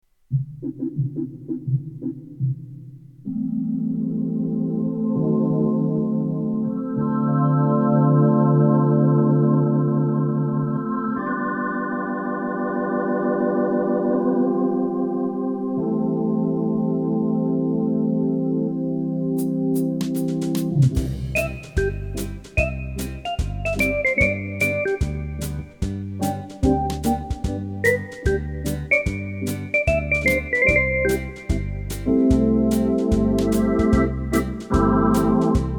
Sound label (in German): Orgelbeispiele; VIVA MUSICA Fachhandel